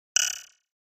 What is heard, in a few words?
Button Elect Spring